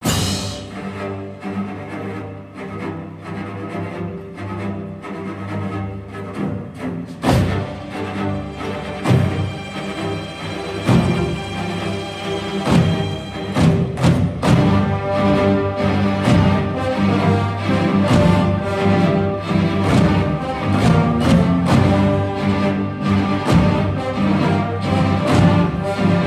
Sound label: Band Ringtone